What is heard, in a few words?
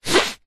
human sniff snotty short